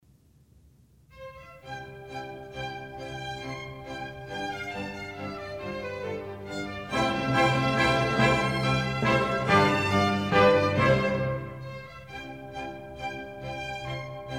German Dances, C major

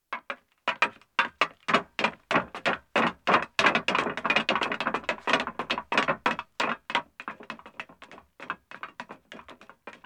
Metal Stairs Ascending, 2 Men, footsteps; BBC Sound Effects Library

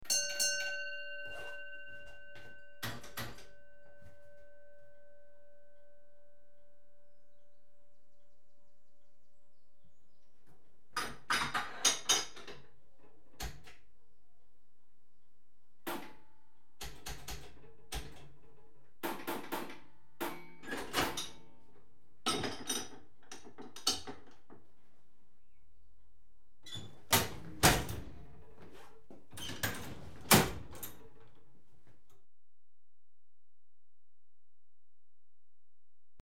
Signalling Equipment Train Enteri; BBC Sound Effects Library; Age Of Steam

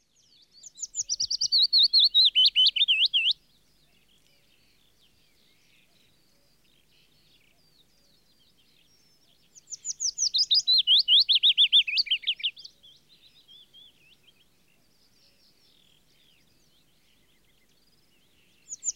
Willow Warbler, singing; The BBC Sound Effects Library

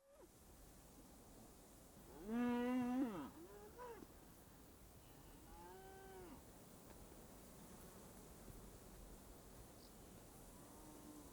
Cattle on Tarmac, Livestock; BBC Sound Effects Library

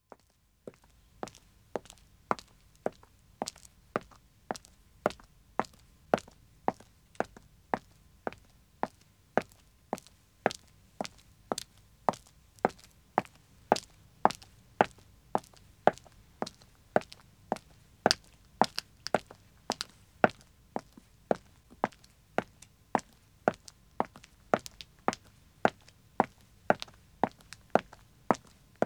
Pavement Walking 1 woman star, Footsteps; The BBC Sound Effects Library

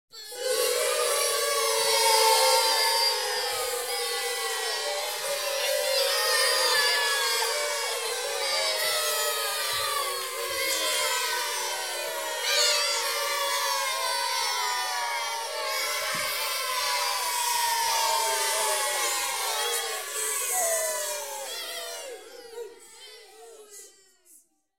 Babies And Children - Children Booing And Hissing; Rec. At St. Luke's Primary, Brighton

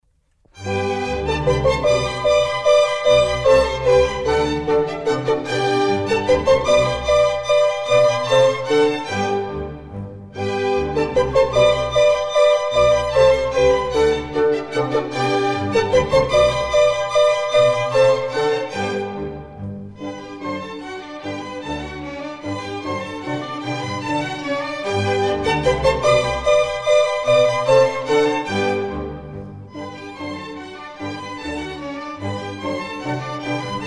German Dances, G Major; Mozart; Dances and Marches

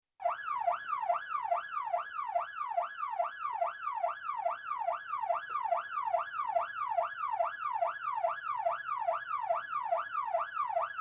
Yelp Siren (Interior Acoustic); Emergency; BBC Sound Effects Library

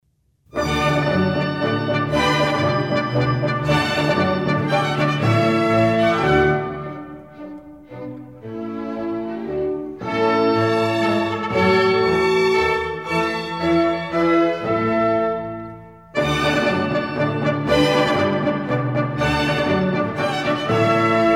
Minuets, D Major; Mozart; Dances and Marches

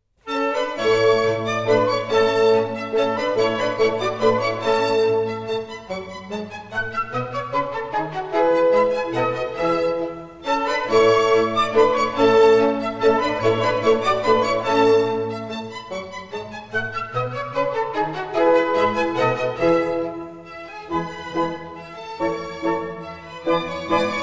German Dances, A major; Mozart; Dances and Marches

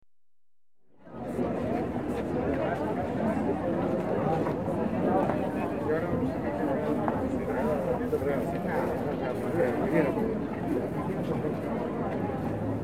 Crowds Milling Around Outside Spa, Spain; The BBC Sound Effects Library

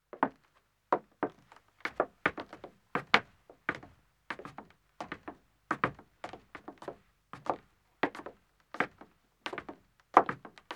Wooden Stairs Ascending, 1 Man, footsteps; BBC Sound Effects Library

